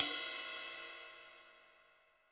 Pop Ride, cymbals; Roland BK-5

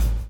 BLOW; Spectrasonics - Backbeat

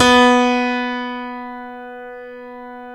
StereoGrand, Keyboards

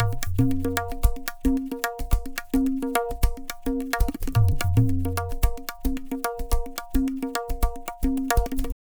tabla, 110 bpm; Zero-G; Pure Tabla

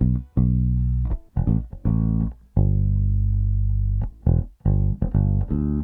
Soul Bass, 70s Analog Funk; Sonic Foundry (Sony Creative Software); What it Is!

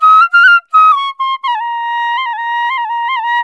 INDIA PICC

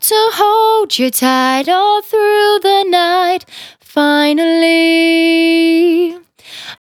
Finally 70bpm C, Velvet Vocal; Zero-G

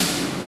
SNR AMBO, Snares; Roland - Rhythm Section; Snares 6; Sn Modules 6

